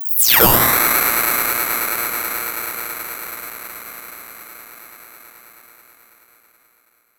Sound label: Robot; Sonic Foundry (Sony Creative Software); Underground UK House & Electro